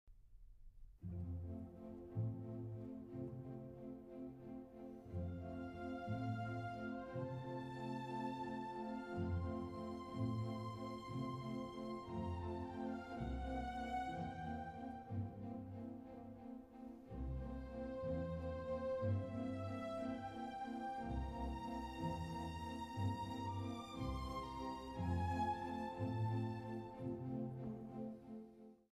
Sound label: Piano Concerto 2nd movement; Mozart; Piano Concerto No. 21